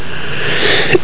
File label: hicup